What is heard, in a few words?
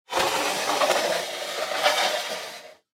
Propane Tank Drag; Machinery; Foley